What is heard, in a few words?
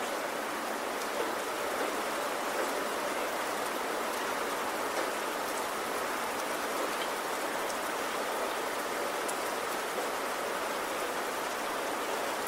Nature Sounds